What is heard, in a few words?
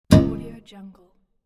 error